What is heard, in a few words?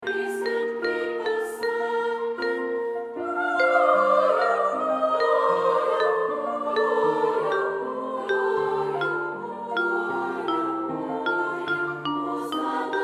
SSA Alt; cantaarte advents und weihnachtslieder 2025